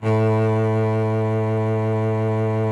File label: SOLO CELLO A1; Greytsounds VOL-1